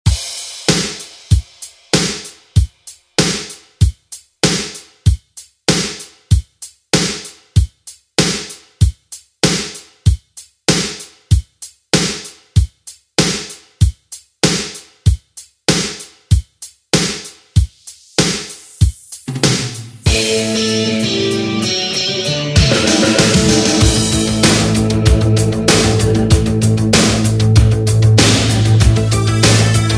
80s ReDrum; DJ Mhark

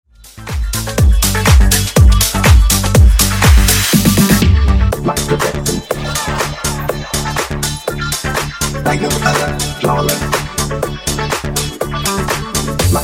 Funk 122 Bpm

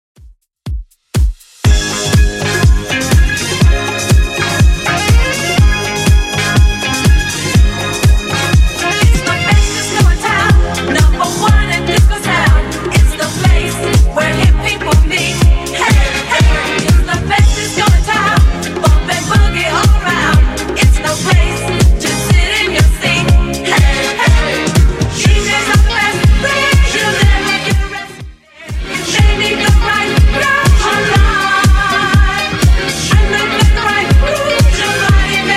70s Redrum 122bpm CLEAN

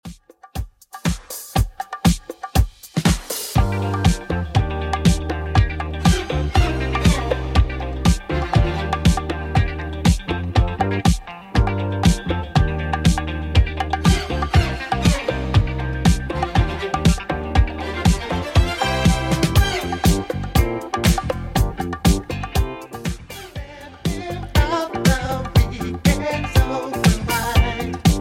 80s Redrum 120bpm

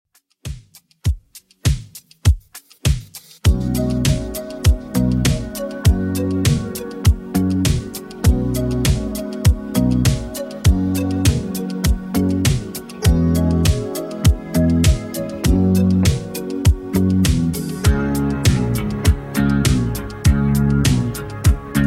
80s 100bpm; Redrum

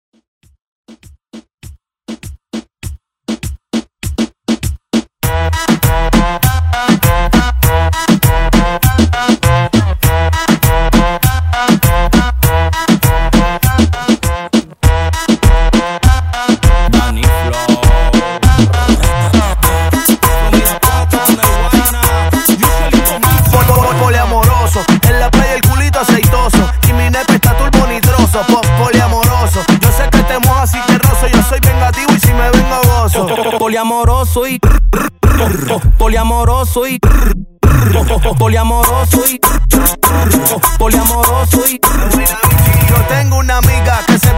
Reggaeton Redrum

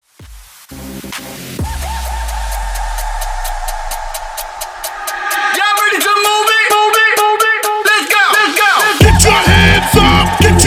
bigroom bounce rebuild 129 bpm

